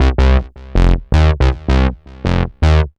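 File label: synth bass, drum and bass, 160 bpm, C; eJay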